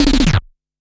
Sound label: Game Sound